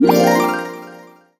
harp up

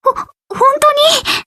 surprised

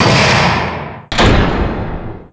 class trial end

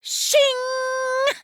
shing; monokumav3

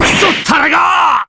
damage

